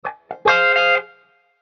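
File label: Musical Element, Drum Snare March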